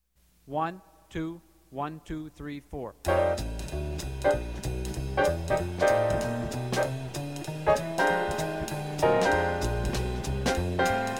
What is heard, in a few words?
fast blues in F winds